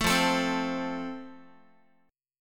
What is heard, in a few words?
F Major, Guitar; strummed; 12,13,12,10,x,x